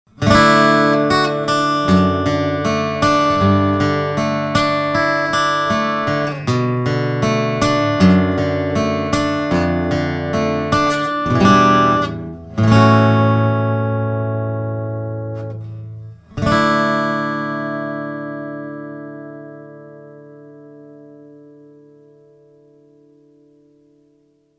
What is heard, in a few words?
ARP PICK A3R, guitars; musical-instruments; el-ac-guitars